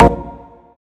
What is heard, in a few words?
Synth Cuts; Vengeance Samples; VES1 Instruments